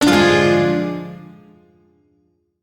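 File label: Shawzin Minor Chord; B; Warframe; Duviri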